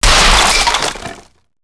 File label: break wood box; breakable